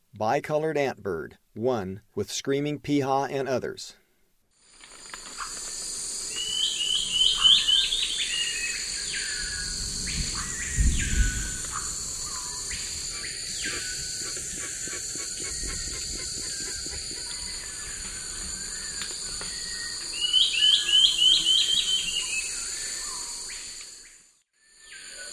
Bicolored Antbird; S. America; Eastern Lowlands

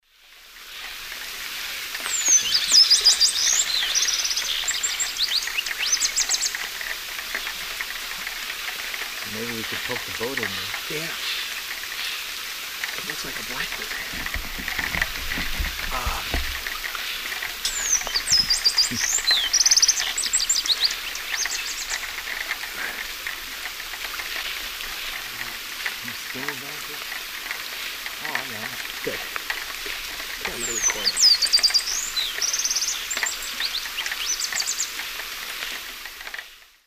Molothrus bonariensis, song; S. America; Eastern Lowlands

